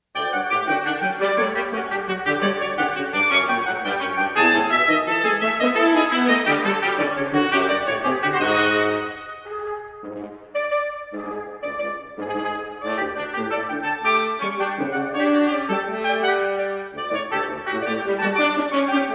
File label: Fanfare